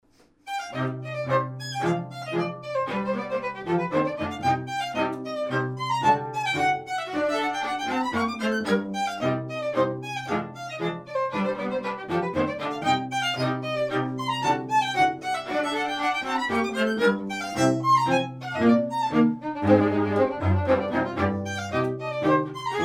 trio

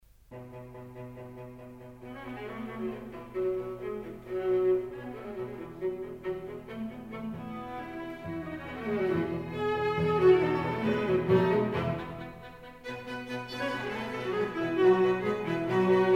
quintet

